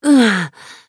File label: pain; reyna